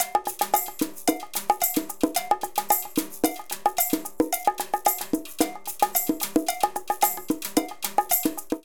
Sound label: Funk 111bpm, Latin Percussion; Funk 2; Ableton; Factory Packs